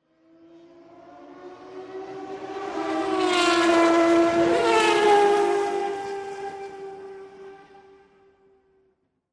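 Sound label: Sports Car Close Up By Fast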